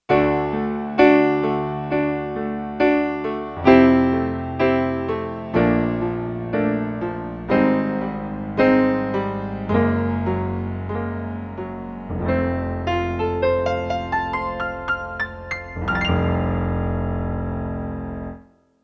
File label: Acoustic Piano, Kurzweil K2500X; Factory Programs